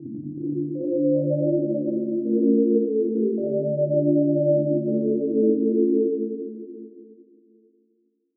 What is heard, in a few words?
Hybrid Standard UK Drill; midi wizard 2; Unison